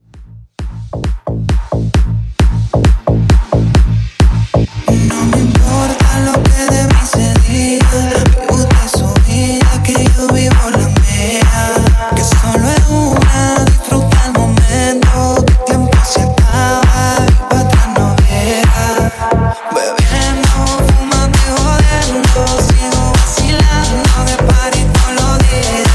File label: Epic Hook 133 Bpm